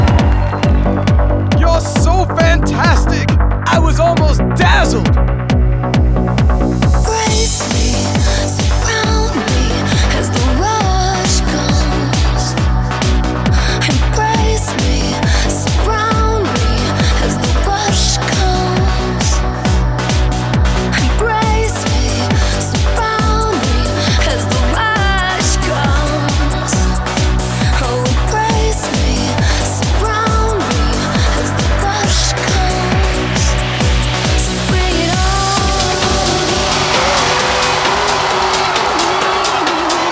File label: (Sweeping Strings Radio Edit); Dance Dance Revolution Festival Strike